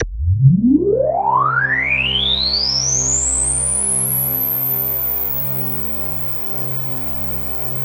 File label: KORG DW8000, Vintage Keyboards; Big Fish Audio - First Call Vintage Keyboards